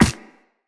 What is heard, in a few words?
Run Ice, Footsteps; Characters; Operator